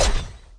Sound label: Knife Throw Single